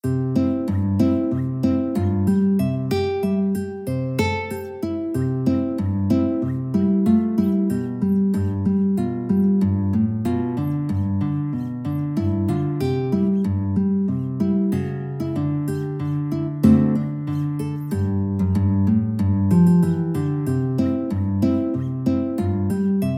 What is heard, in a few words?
guitar